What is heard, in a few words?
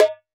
Doholla Rim, Percussion